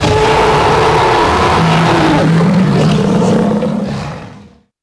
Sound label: Spino Long Roar, Dino